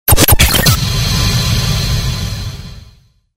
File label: Galery Noise; Sound Ideas - Galery Noise 002